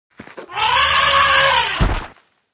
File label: Mammoth Action